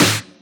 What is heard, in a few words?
Snares; Vengeance - Essential Clubsounds Vol.4